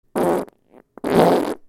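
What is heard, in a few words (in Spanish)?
pedos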